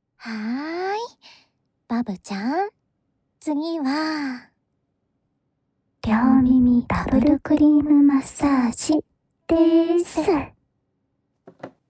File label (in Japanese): クリームでＷ耳マッサージ